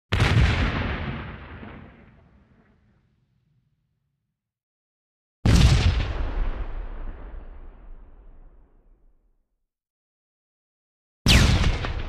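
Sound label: grenade sound with echo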